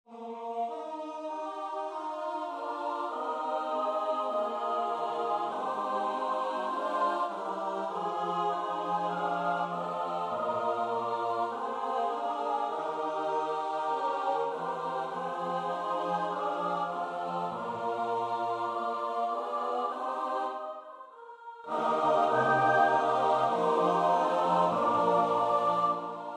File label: midi; adoro te o panis coelice